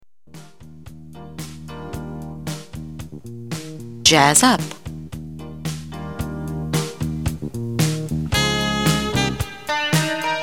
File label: jazz up